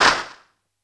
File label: E6 Applause Short, Yamaha PSR-E323 Sound Effect Kit